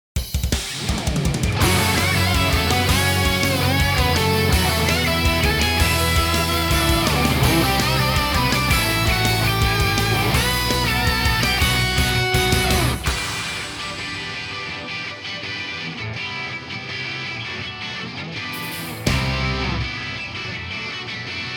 off vocal

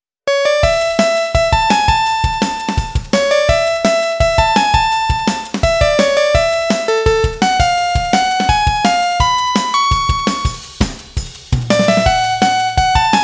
8bit love melo; オクターブガールズ～チップチューン箱守八千代～; 8bitラヴソングトラック＆BGMトラック

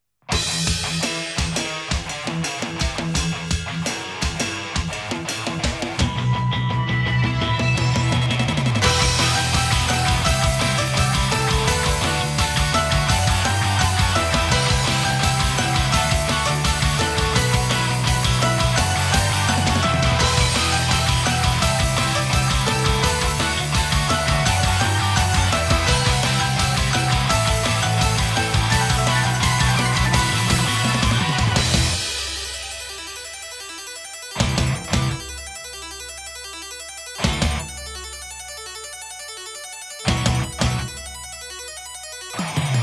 Off Vocal

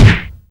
punch; 搾精悪魔ちゃんの5日間寸止めゲーム～最後の日に失敗しちゃったら惨めな結末を迎えるよ～

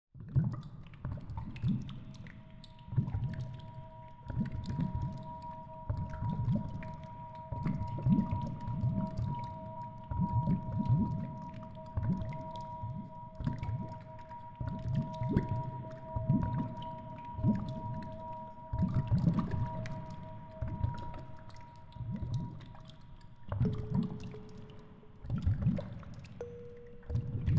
healing music

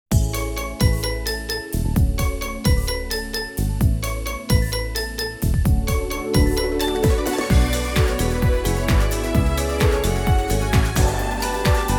Off Vocal

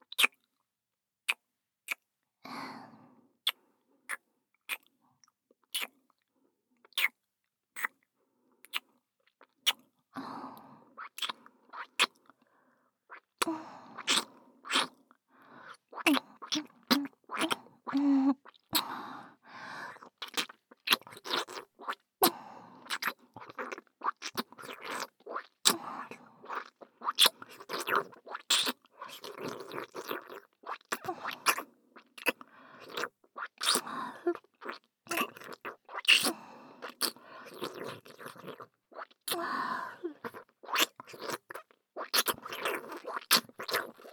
Kiss mid; 搾精悪魔ちゃんの5日間寸止めゲーム～最後の日に失敗しちゃったら惨めな結末を迎えるよ～

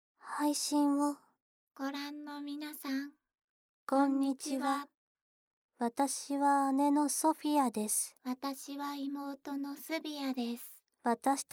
kusuguri, ASMR

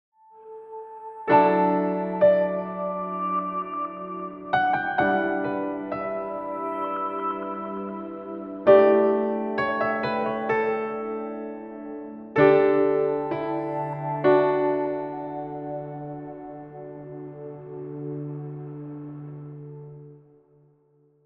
Jingle

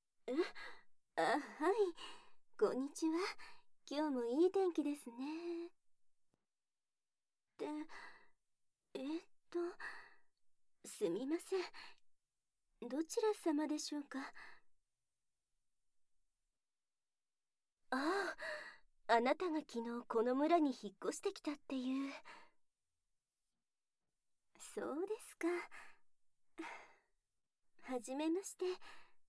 Off SE, ASMR

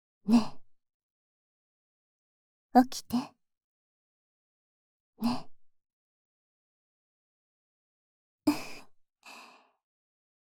Ear, Breast & Nipple Licking, ASMR

